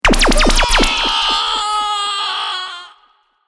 Laser Death, Agonising male scream as disintegrated by a laser gun; Battle